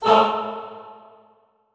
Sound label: FAH A2, Classical Choir; Best Service; Extended Classical Choir